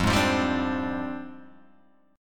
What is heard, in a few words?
F Minor 6th Add 9th, Guitar, Open G